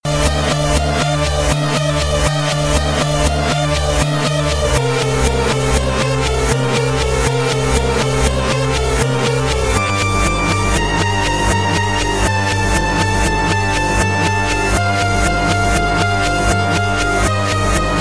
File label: rage music